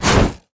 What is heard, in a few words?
basic attack dragon; LoL; shyvana